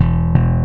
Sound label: SLAP